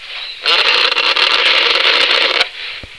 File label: growl; Powdermill